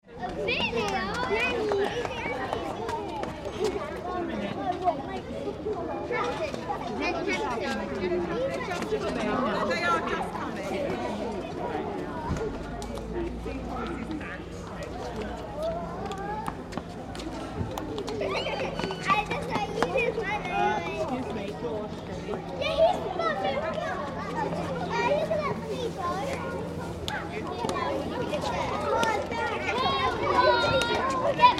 School Children waiting in line, Primary kids queuing; voices and activity